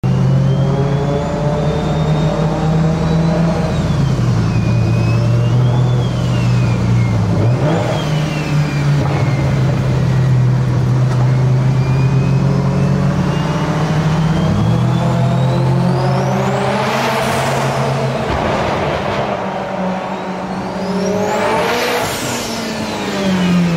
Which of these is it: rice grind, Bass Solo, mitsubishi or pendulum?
mitsubishi